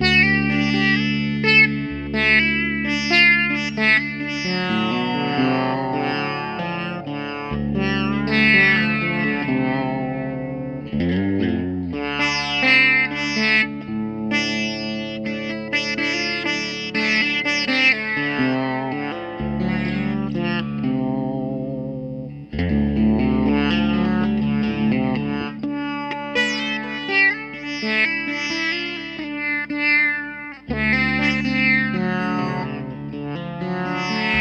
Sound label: sitar